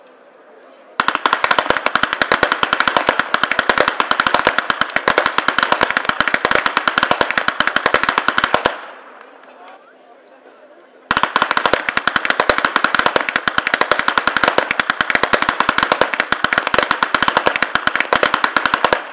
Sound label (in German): schnalzen, flachgau